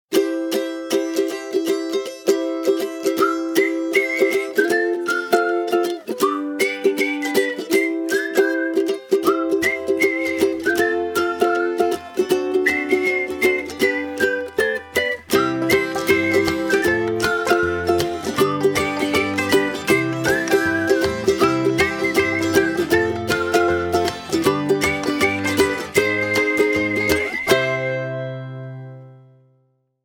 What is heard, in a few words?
Bumbly Bee; PRODUCTION MUSIC